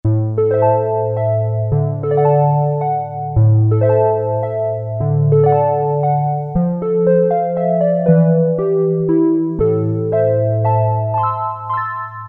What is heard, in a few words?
Chorus Vibes, Roland Juno-106; Factory Preset